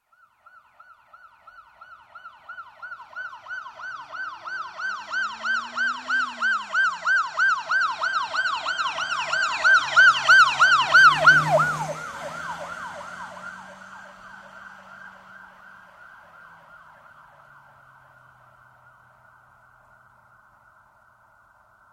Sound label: Police car, pass from left to right with yelp siren; Emergency Vehicle; BBC